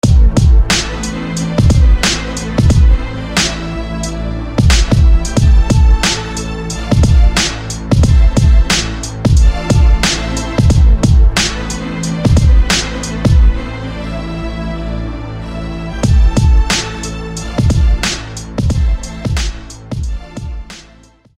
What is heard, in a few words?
Blood Strings